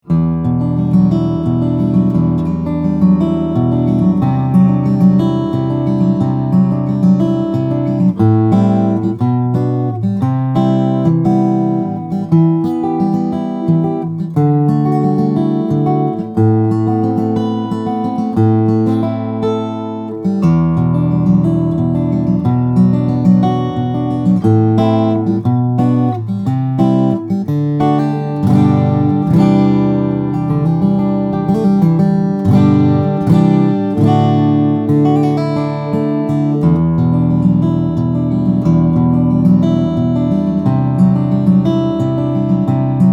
RainSong CO-WS1000N2T, steel-string guitars, flattop